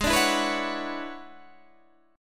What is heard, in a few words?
E 13th G sharp